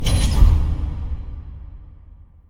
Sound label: ui confirm; Dark Souls III